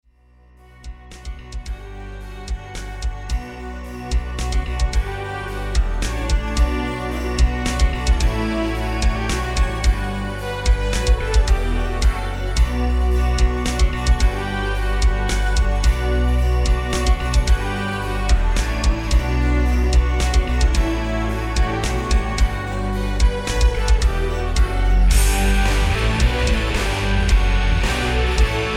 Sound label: synth version test